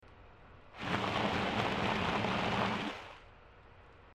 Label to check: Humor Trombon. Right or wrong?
Wrong